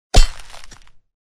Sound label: mining; deathmatch; action employment; jobs